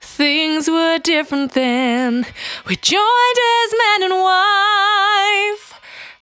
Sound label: Cry 68bpm B, Vocal; Zero-G